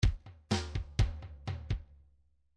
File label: midi grooves rock